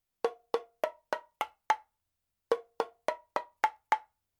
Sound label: petit dundun set